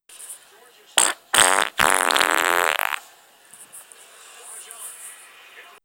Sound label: fart